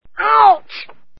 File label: CHILD